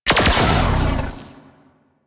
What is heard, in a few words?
Shot A; Warframe; Weapons; Corpus; Vandal